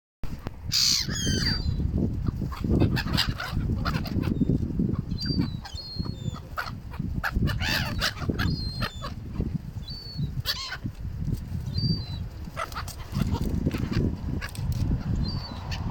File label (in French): mouette rieuse chroicocep